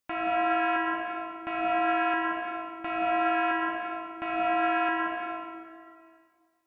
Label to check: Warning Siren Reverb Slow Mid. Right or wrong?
Right